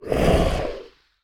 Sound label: Voboola Roar